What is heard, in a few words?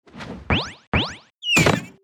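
attack; hero sound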